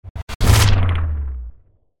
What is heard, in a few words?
hit organic; sonniss gdc